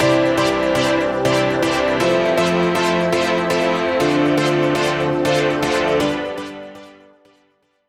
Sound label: all chord